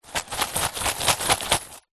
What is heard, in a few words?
purse pouch leather containing coins and notes shake; zapsplat; foley